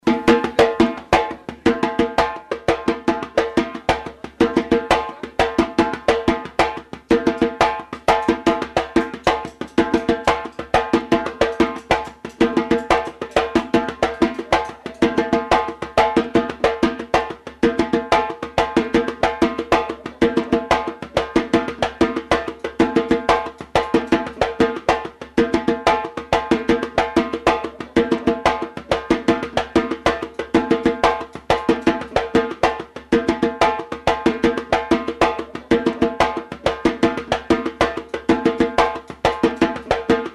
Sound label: timbal